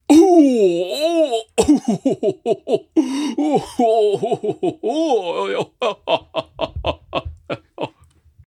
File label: stun